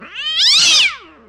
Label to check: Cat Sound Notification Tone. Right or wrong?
Right